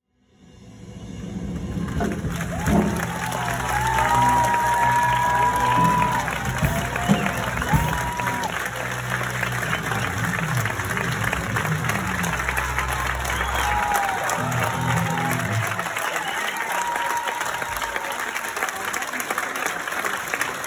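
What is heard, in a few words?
applause, whistles and shouts of bravo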